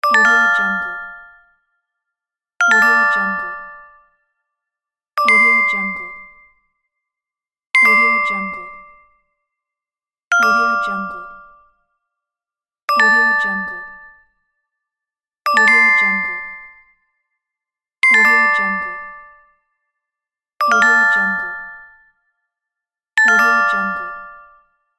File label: notifications; sergeyyukon